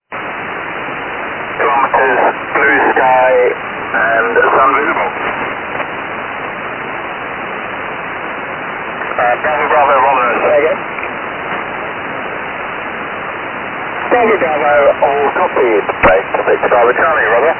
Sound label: 7775kHz; BRIT ANTARCT